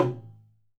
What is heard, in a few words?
DHOL BEND, ETH PERC; WorldSounds